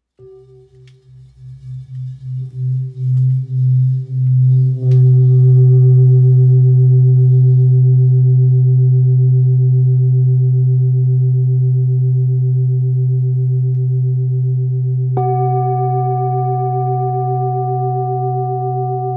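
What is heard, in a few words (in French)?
Bengale do, bols chantants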